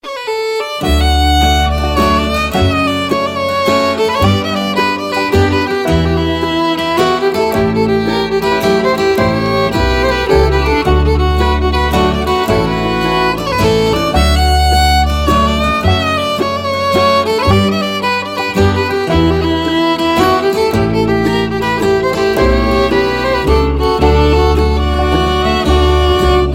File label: Waltz